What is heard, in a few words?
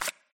card slide